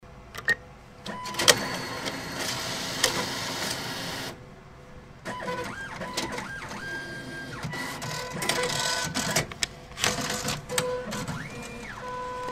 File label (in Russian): bankomat vydacha